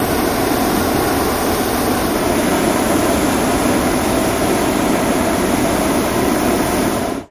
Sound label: Hot Air Balloon Burner, Medium Close, Very Long